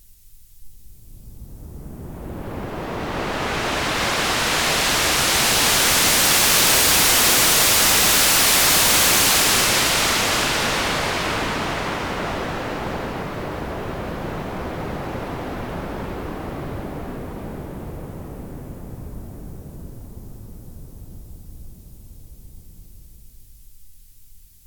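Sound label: noise low-pass filter stereo no resonance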